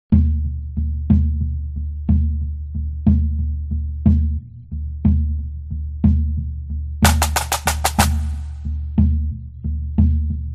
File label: Kilted Music; HIGHLANDER